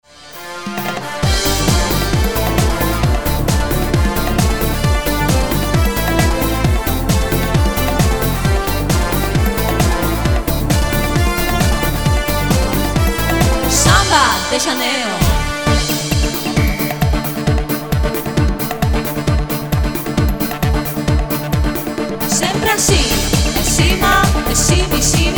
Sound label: SAMBA